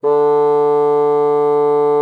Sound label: D3, bassoon; Xsample VOL-6